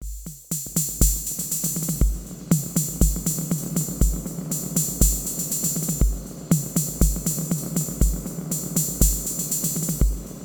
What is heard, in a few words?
Echo Ethereal Canyon; Ableton